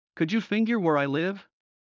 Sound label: Heart fast; Classroom humanbody